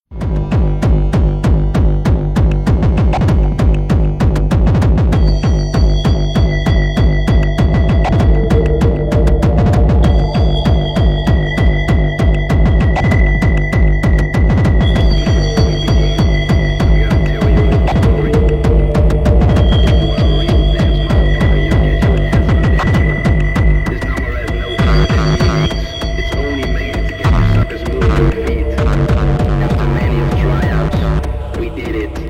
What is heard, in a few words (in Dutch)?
Liveset Drumcomputers En Vinyl